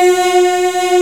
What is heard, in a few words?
MMM F#3, POP CHORUS